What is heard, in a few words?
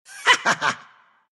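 laugh, Russian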